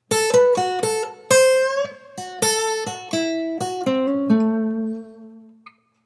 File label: minor major combi lick